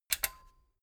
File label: metal button stereo click enable fast